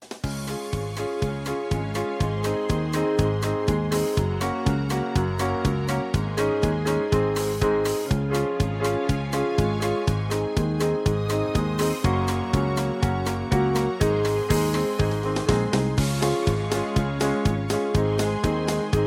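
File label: Instrumental without background vocals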